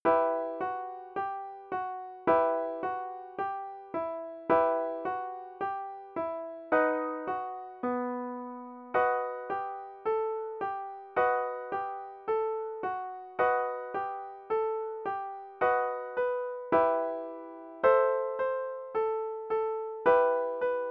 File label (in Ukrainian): melodiya pisni; Sknarki Zhadka